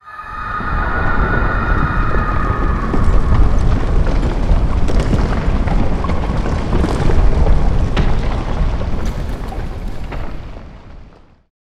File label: abyss quake